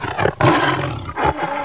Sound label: growl